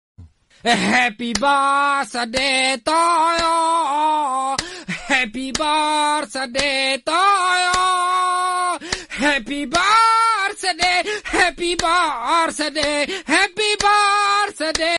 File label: happy birthday birthday song